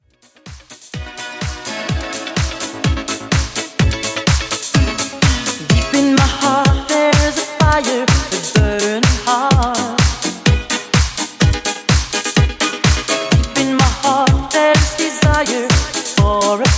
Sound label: 126 Bpm; 80s Redrum